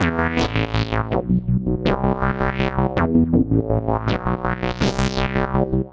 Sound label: A Minor 162 bpm, Bassline; MIDI Clips; Tonal; Skitter and Step; Ableton Factory Packs